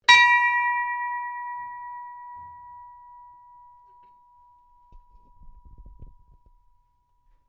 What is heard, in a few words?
Ships Bell Ring; Various ships bells; Boats; Vehicles; HMAS Shropshire